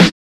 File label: Snares